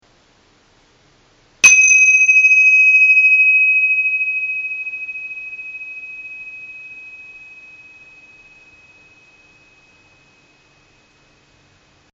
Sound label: Tibetan Cymbals; bells gongs; meditation supplies